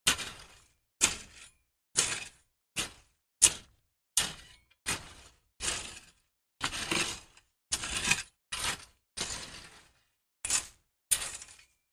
Rake Dirt

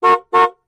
Car Horn Honks Mid Siz

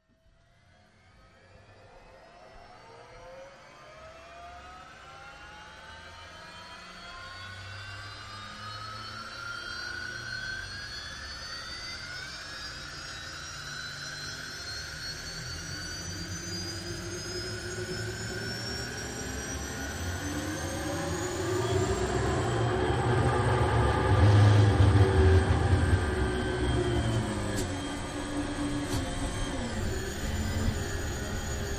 Hydroplane Interna

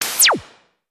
Sharp Laser Shot, zaps

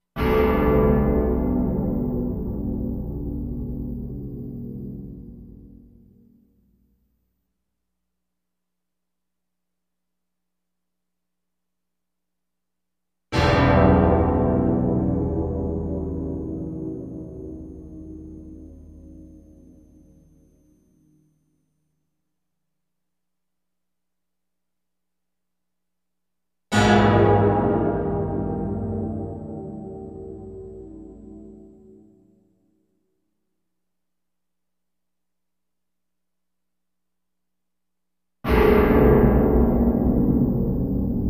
Death Chime Tolls; Death Chime Tolls Ho

